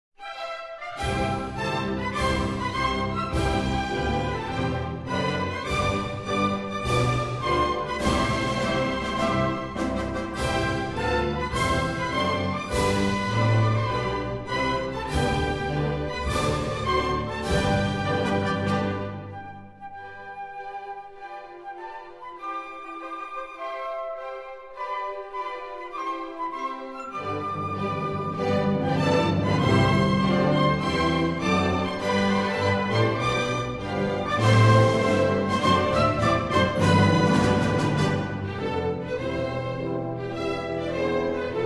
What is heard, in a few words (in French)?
Hymne National Belgique; hymnes